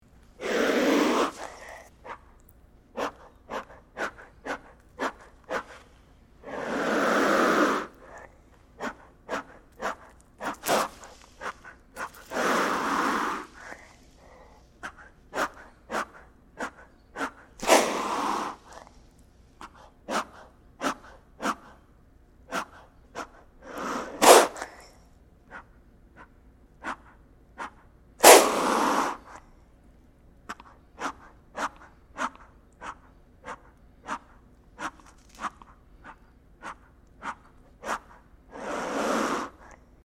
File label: gila monster